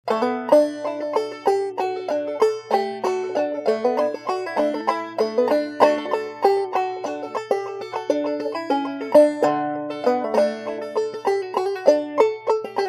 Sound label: andy banjo